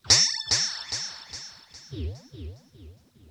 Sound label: RIPPLES R, Funk Guitar; 90 s Sample CDs; Zero G